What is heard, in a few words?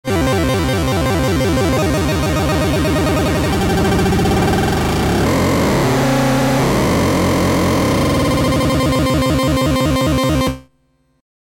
midibox sid